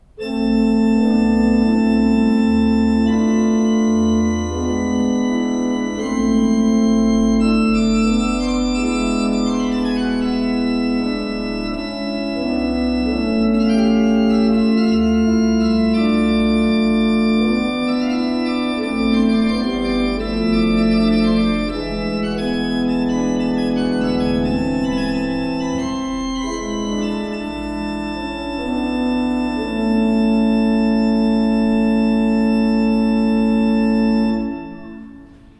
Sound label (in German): Euler II P, Orgel